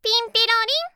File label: Ping-pi-ro-ring